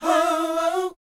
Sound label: SHOO B F#, R&B Dance, vocal; Spectrasonics Vocal Planet